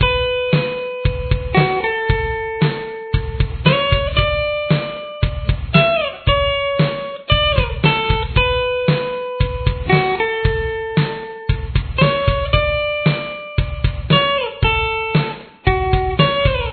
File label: verse guitar; The guitar uses a capo on the 2nd fret throughout the song.